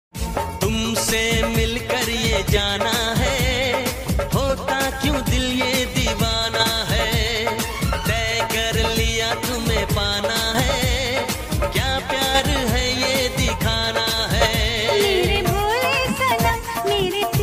Old Ringtone

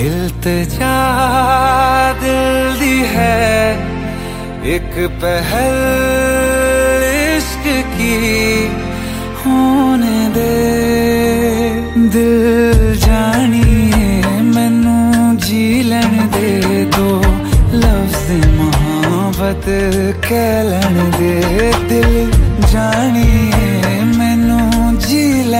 New Punjabi Song Ringtone

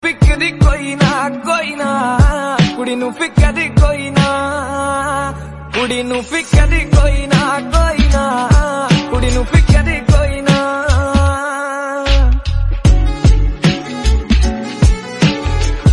Punjabi Song

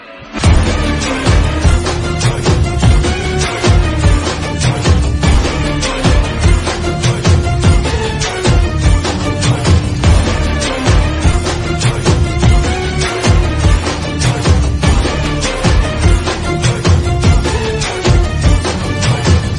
BGM Ringtone

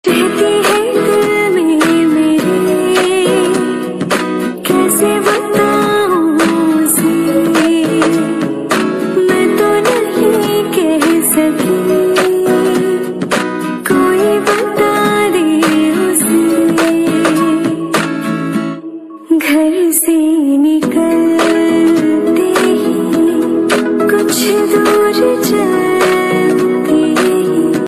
Female Version Ringtone

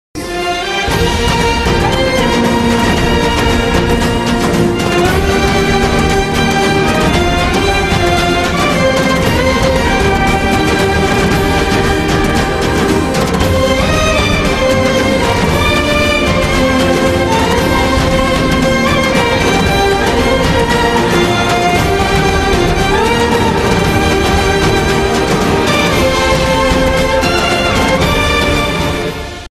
Instrumental Ringtone; Kasauti Zindagi Ki 2

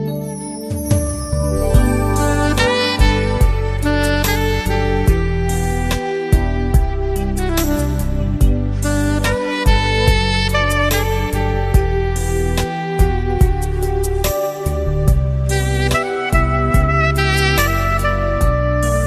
Instrumental Song Ringtone

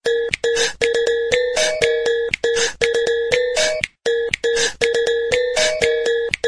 Instrumental Ringtone

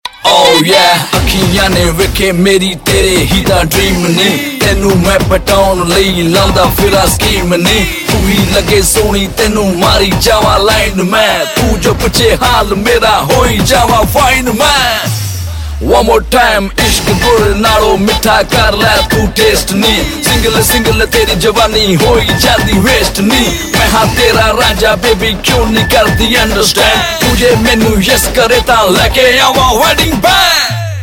Bollywood Rap Song Ringtone

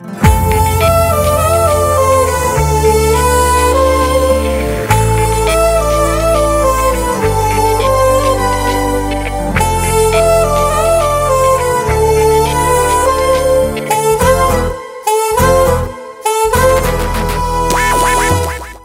Tamil Music Ringtone

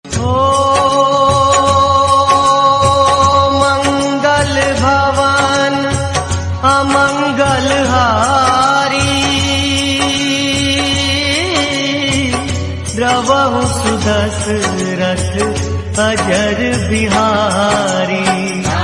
Hindi Devotional Ringtone

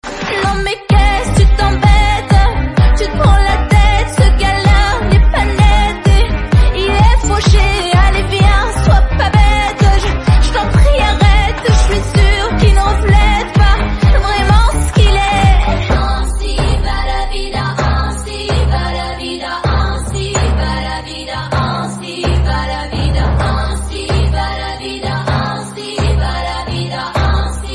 Song Ringtone

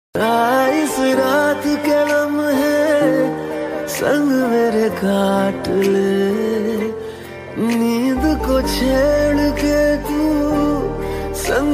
Romantic Hindi Song Ringtone

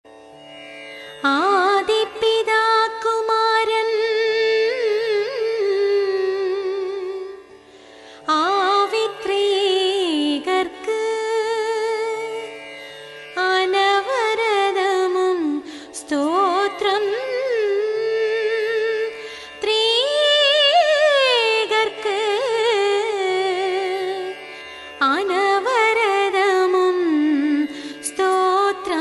Tamil Christian Ringtone

